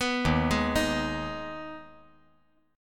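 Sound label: E flat Suspended 4th Sharp 5th, chord; Ebsus4#5 arpeggiated; JGuitar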